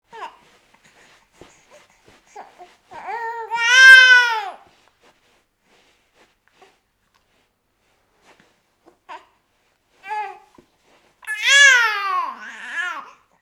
baby cooing; human

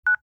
cell phone

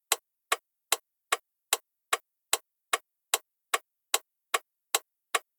bus turn signal ticking; transportation

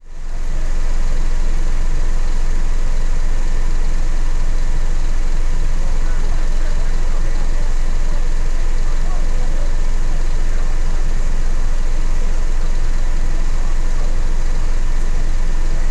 bus idle interior; transportation

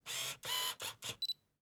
camera focusing; mechanical

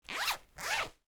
jacket zipper, cloth

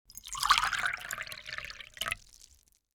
pouring liquid; drinks

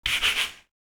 brushing; cleaning

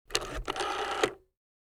rotary phone

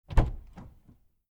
car door open; transportation

